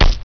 bullet hit

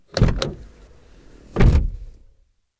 car door

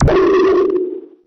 gunship ping search; scmod; alienassassin

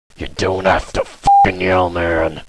yell

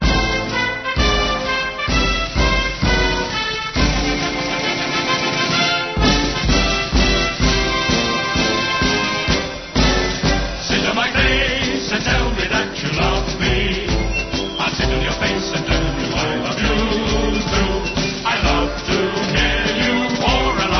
radio music

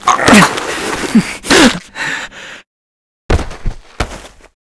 strangle

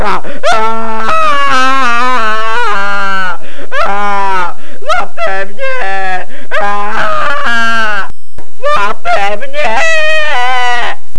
scream

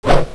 machete miss

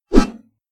sword swing; ragemap2024; grunt

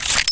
m79 insert, weapons

